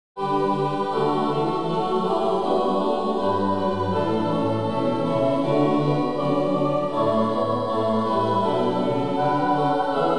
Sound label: Quartet